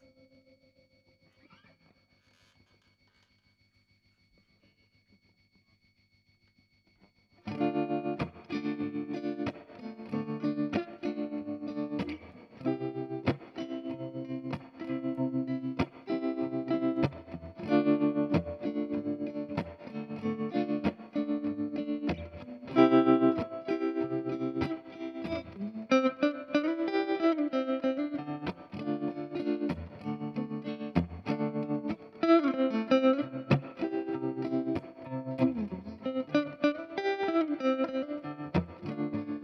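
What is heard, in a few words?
guitar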